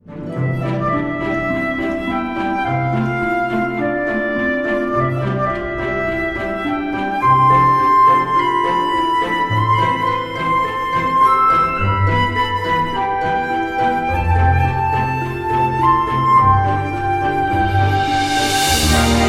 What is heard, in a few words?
english country garden ringtone